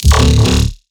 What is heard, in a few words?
Riddim Bass Hit D; Ghosthack; Rino